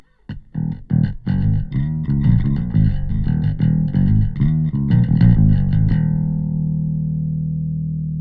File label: Sub Bass 808 Bass SUB 100; SubBass Doctor